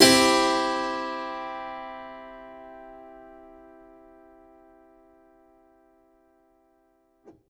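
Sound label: Piano Harpsichord major; TrashLibrary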